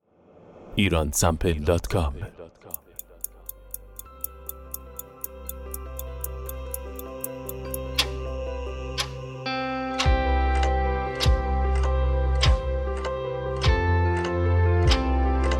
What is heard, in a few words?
clock; Iransample